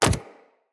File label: Companion Step Default, Footsteps; Pets; Zanuka